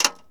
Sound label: Plane P40 Lever Cowl Flap; Airplanes